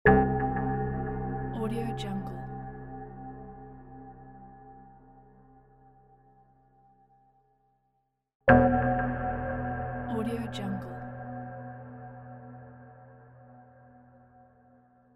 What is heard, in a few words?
dark ethereal bells